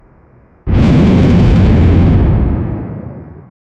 deep boom wood splinter

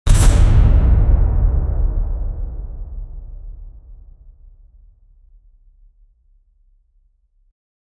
a cinematic trailer impac

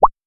generate a sound effect, bubbles

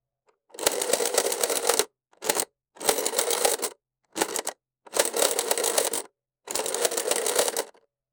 money counter machine

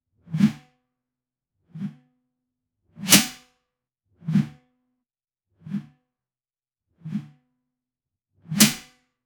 monster swing arm; fight

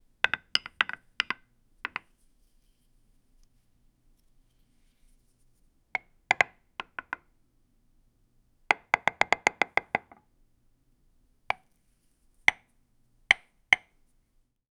chalk engraving on a blac, classroom

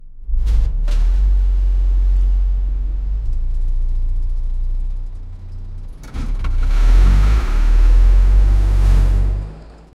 Renault Agora bus exhaust

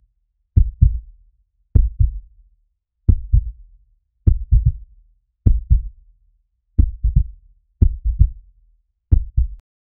cinematic heartbeat loud

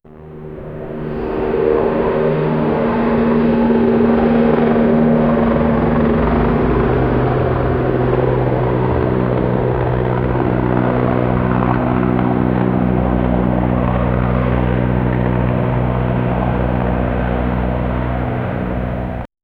the sound of a helicopter, beach

